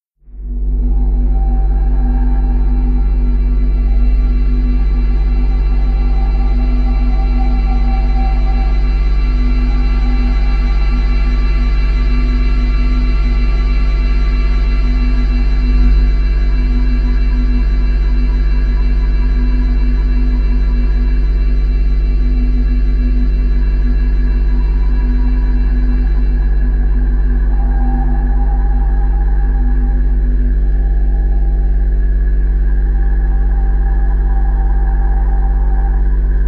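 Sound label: Deep Shimmer Distant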